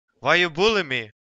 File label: doorbell sound